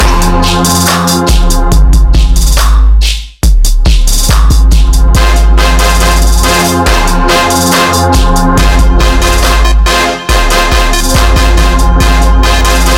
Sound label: Trap